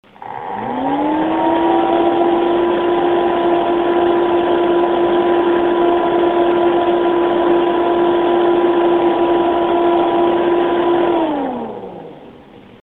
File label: cooler master, hi